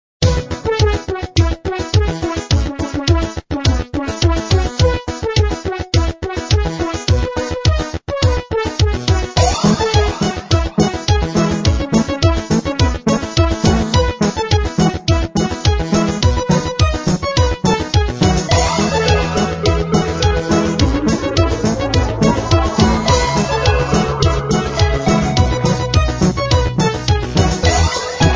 music bg; magic heaven

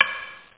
stick only; OctaMED SoundStudio V1; jazzies samples

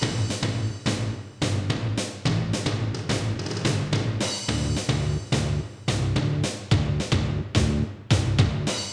rock

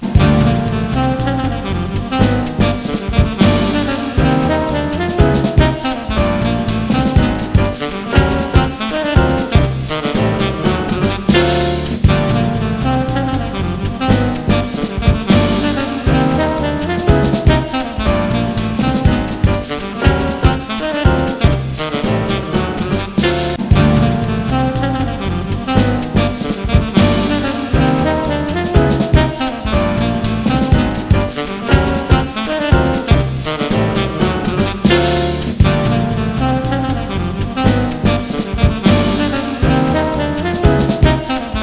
jazz

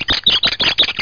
Squeaker; Bible Fun Pack; Noah

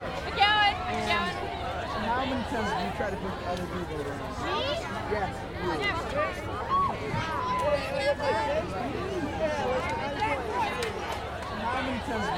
Teen Crowd; The Hollywood Edge; Ambience Outside

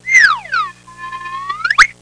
slide whistle; Sound Effects for Multimedia - Volume 1; instruments various

